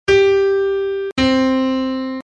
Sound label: Note G C